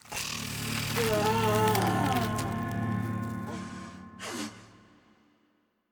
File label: Spider To Human; The Inn Zara; Devour 2021 gamerip pc macos